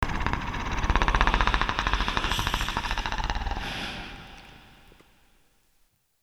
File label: Aliens Monsters; FXHome